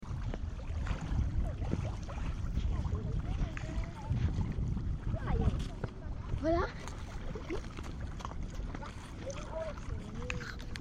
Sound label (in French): clapotement